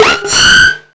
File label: NUCLEAR, Hard & Loud Techno; USB Soundscan vol.01; AKAI